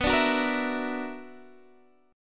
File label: B-Minor Major 11th B down Guitar Drop D